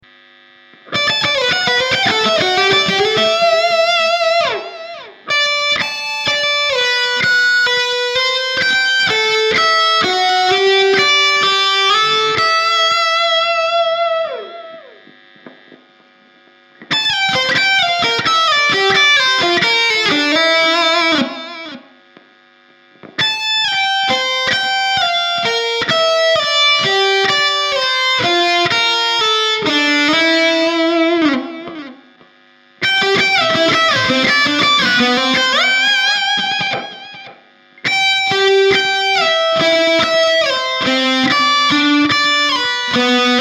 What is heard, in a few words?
lead